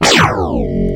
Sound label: Laser Zap